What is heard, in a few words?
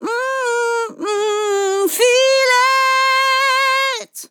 LYRICS Fills 120bpm Fm